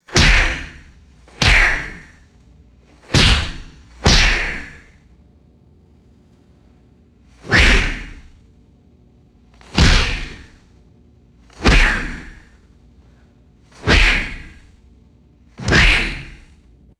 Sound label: thick boxing ring ropes slapped and rattling